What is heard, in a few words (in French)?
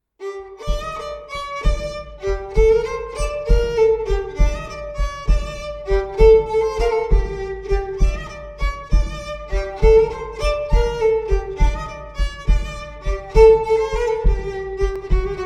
Bourree auvergnate